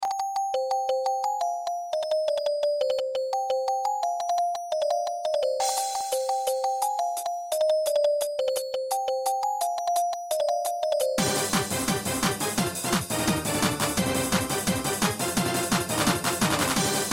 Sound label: eurobeat hits, midi